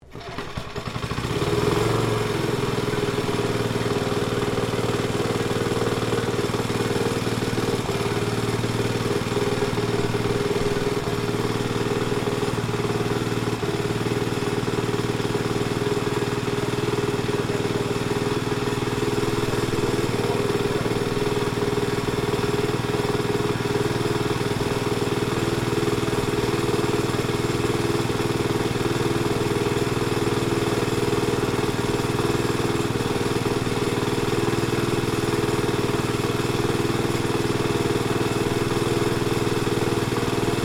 Engine Jap London operating, Classic industrial machinery; 1951; Motors; Machines